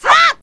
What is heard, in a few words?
miss; zelda; weapons